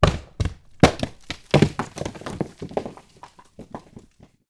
rock hit

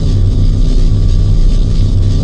electric; razorback

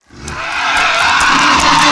divine wind fire

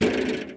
jump pad round

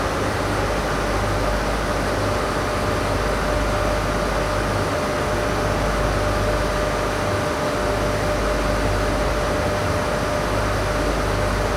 ambience large

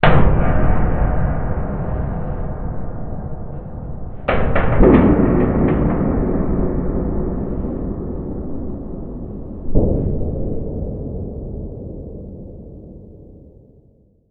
big hit far

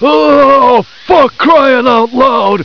cry loud

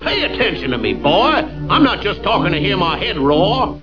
head roar

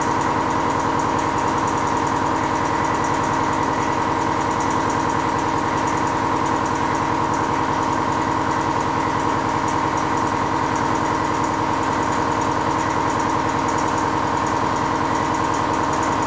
ambience

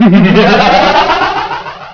terror laugh

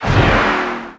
end cloak

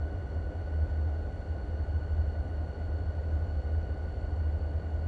loop machinery

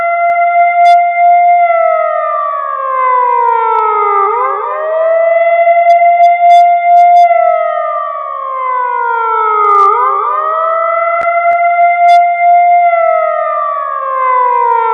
blowout

